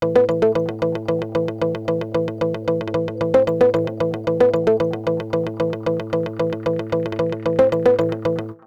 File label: Melody, Akai Pads; Mule